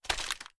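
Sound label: reload empty movement; reloads; gmod; iw8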